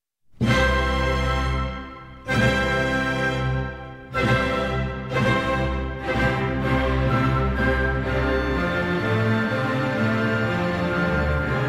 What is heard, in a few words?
Piano Concerto; Mozart, Wolfgang Amadeus; No. 25 in C, K 503